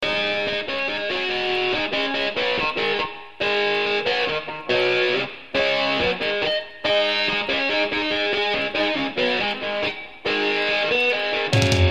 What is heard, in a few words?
thrilling music call notification tone